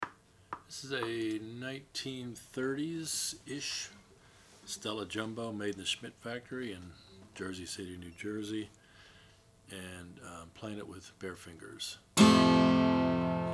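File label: oscar schmidt stella jumbo decalcomania, Guitars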